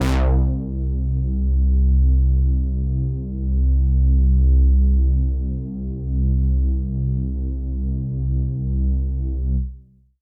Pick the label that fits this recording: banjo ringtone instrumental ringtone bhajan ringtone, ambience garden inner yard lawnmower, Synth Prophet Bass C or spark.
Synth Prophet Bass C